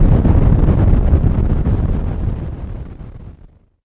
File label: explode; VIDEO GAMES; spacewar